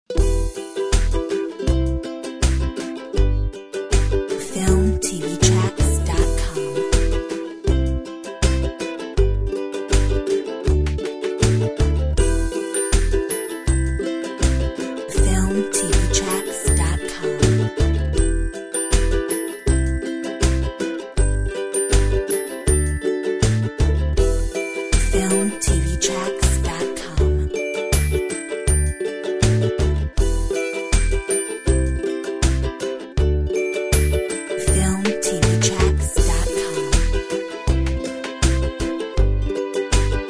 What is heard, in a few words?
Optimistic Ukulele; Filmtv-tracks